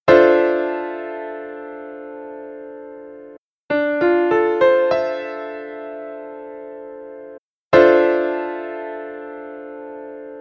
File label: Dm9 Piano Chord